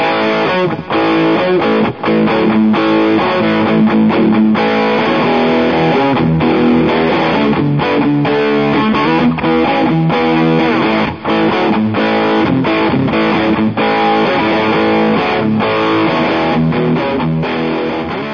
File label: Rock